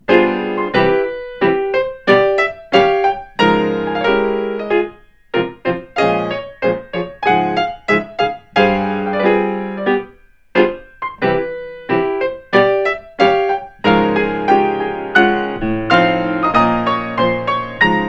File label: Piano Solo